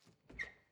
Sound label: refrigerator door open squeak